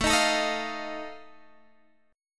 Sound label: Eb Suspended 2nd Flat 5th, A